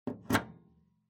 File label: PC Hardware USB Unplug